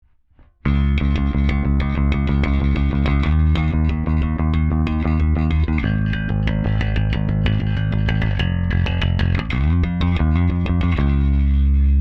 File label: Bass wet